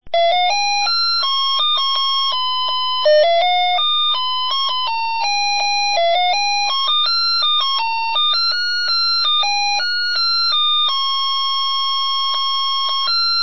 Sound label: melody